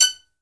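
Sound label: violin staccato F#5, solo violin; AKAI S-Series CD-ROM Sound Library VOL-7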